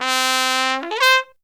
TRUMPET FX; Zero-G; Phantom Horns

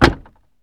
DOOR CLOS, Car; VSI Car

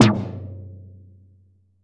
RESO TOM, Drum Kit; AKAI S-Series CD-ROM Sound Library VOL-4

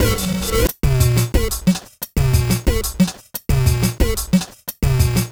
bootboy rev 090bpm; Zero-G; Downbeat Dance Grooves

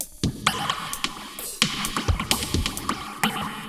Moog Phaser HR-16B (130BPM), cyborg beats; musicradar

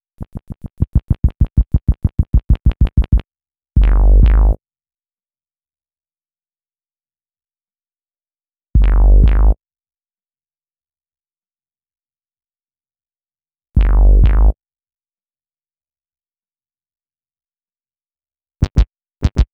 Bass, Hip-Hop; Sonic Foundry (Sony Creative Software); Lukecage Underground Soundlab Hip-Hop

